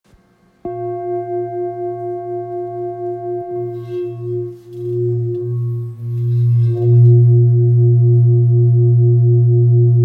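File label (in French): Bol fullmoon grave chakras la diese, bol chantant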